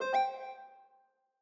Hardware Insert; Longhorn XP Sound Scheme by David Mounder; Reincarnation of Windows XP Sounds in fake Longhorn (Samsung Theme for WinXP) style by David Mounder

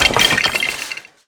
DEBRIS FALL; LEGO Star Wars Force Awakens; Junkbot

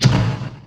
pinata projectile; Drunken Robot Pornography; Inago Rage

